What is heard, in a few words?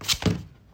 place down, cards; aliens